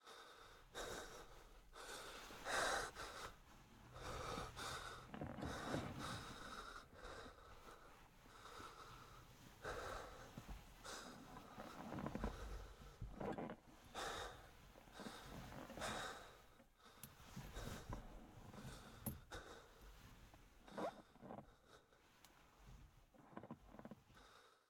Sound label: windows breath; donnie; Blind Drive